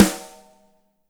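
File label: DRY snare, NATURAL KIT; Spectrasonics; Burning Grooves CD2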